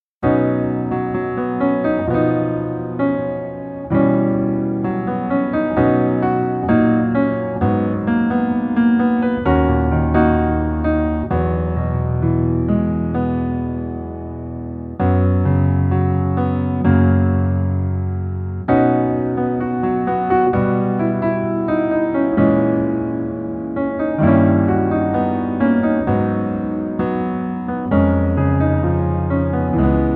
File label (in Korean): Piano ver 멜로디 MR